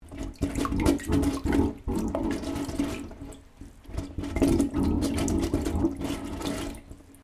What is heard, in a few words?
Water Gurgling In Pipe; Weather